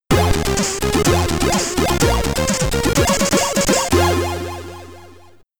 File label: Game Over (SID Stereo); Dyter 07; commodore 64